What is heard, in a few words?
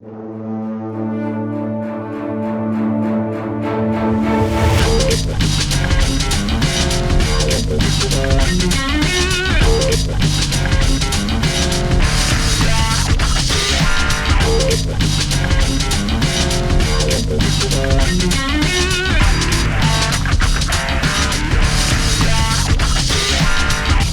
Victory Fanfare